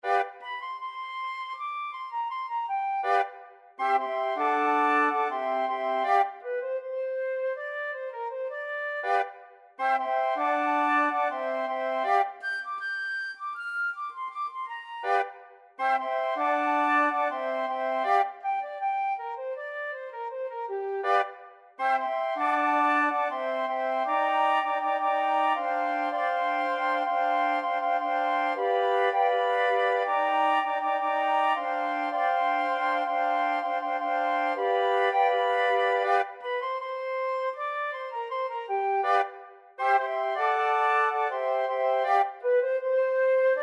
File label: flute choir